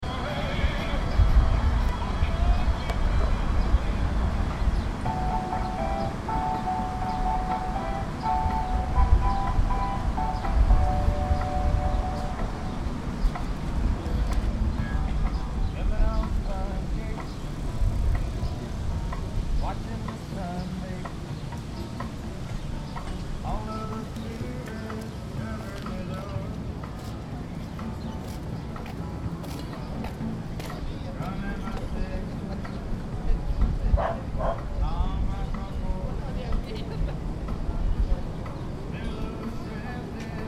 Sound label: Cafe waiting